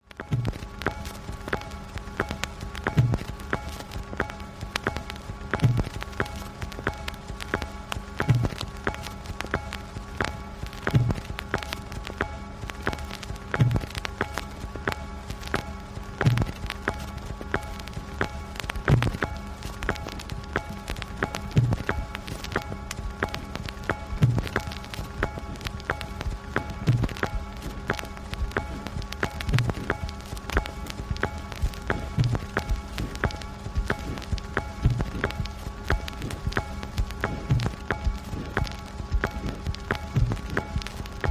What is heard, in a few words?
Tone